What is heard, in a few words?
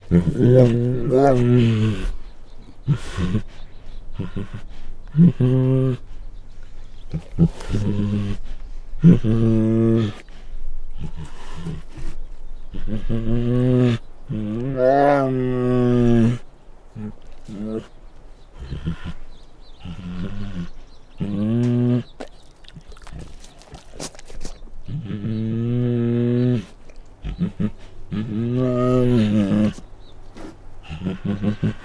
Female Bengal Tiger Growls and Snarls; Sound Ideas; Tigers Sound Effects